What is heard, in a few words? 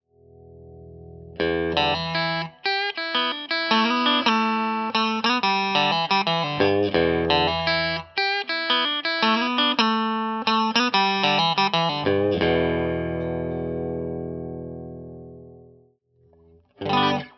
middle, pickups